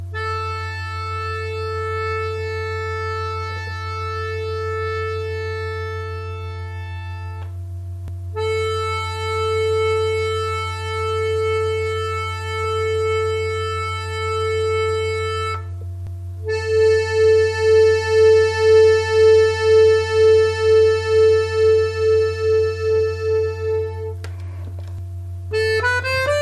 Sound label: vibrato